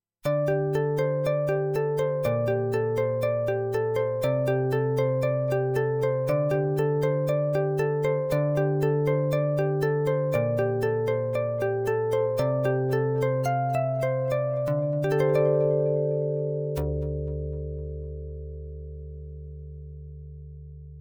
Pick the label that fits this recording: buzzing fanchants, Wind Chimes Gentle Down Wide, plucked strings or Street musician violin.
plucked strings